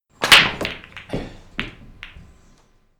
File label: Pool Break